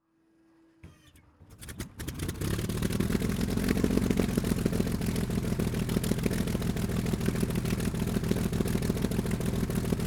Messerschmitt Bf109 G4 stationary startup idle steady rpms shutdown pointing exhaust; Pole Position